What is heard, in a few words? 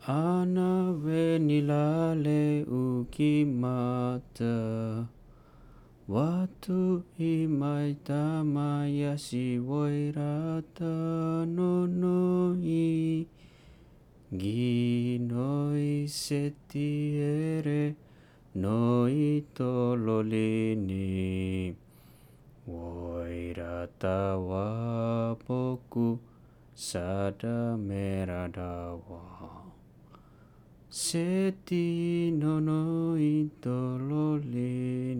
lovesong, makasae; baguia; Ana Venilale u gi mata